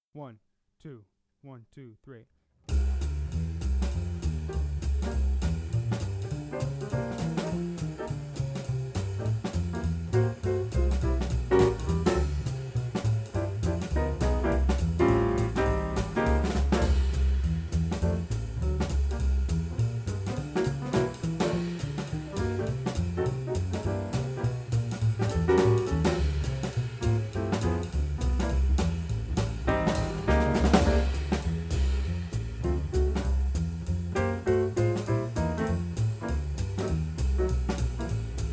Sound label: Bird Blues (Play-Along), Saxophone; Jazz Conception For Saxophone 21 Solo Etudes (Eb Saxo)